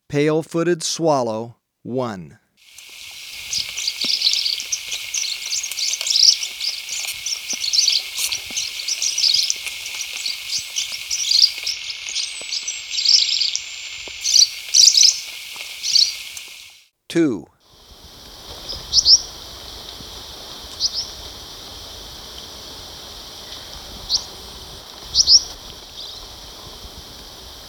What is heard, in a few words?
Pale-Footed Swallow, Birds of Ecuador; S. America